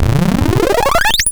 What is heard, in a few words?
Arcade Crispy Bubbles; Cwejman S1; DevSnd Cwejman S1 - JK